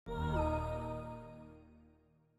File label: Log Off; Longhorn XP Sound Scheme by David Mounder; Reincarnation of Windows XP Sounds in fake Longhorn (Samsung Theme for WinXP) style by David Mounder